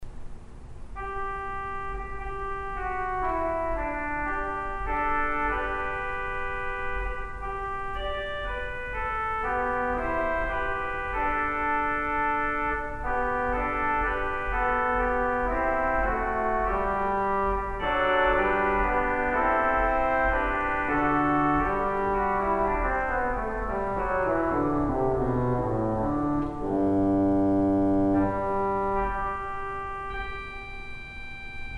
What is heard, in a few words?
oboe